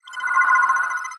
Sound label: damage hits explosions